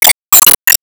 Emo Surprise

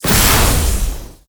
Smash Shot Gold; disney star smash; android

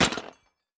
Break Plastic

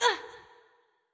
Hurt; Jackie

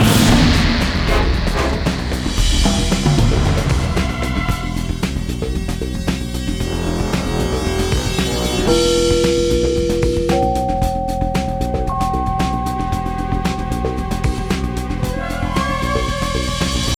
Fight loop; Ben 10 Protector of Earth; Wii

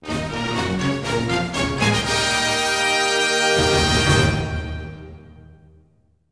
Combat Win; Combat Reg3 Win

